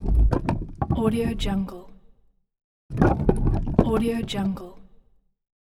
wood log roll

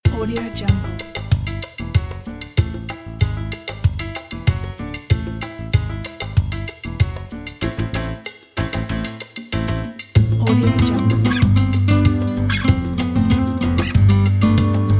latin guitar; red star sound

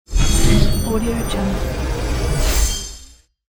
futuristic door open

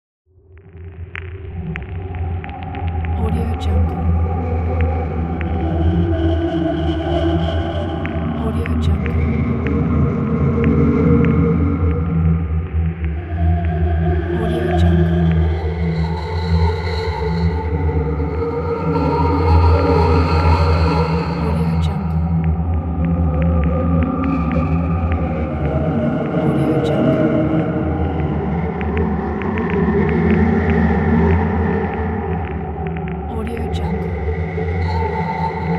horror atmosphere

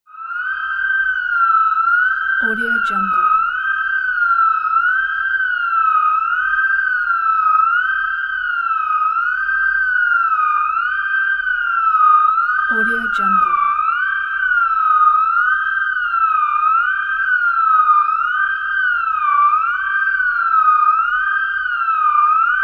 ambulance siren

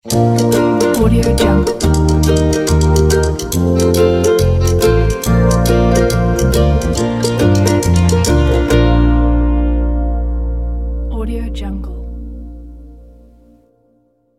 ukulele logo

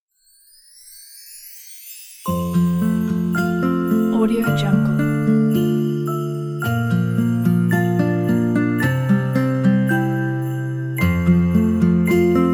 hark the herald angels sing; lullaby-music

